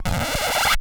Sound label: scratch; Sonic Foundry (Sony Creative Software); Ugly Remnants VOL-2; Beatstuff